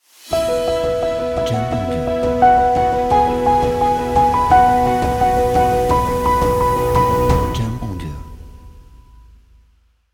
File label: Uplifting Logo